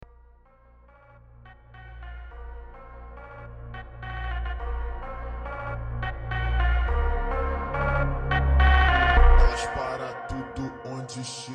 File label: Brazilian Fonk